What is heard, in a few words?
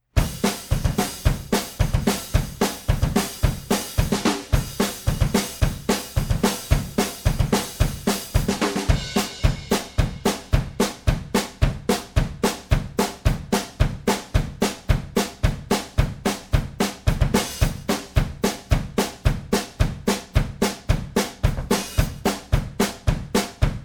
drums only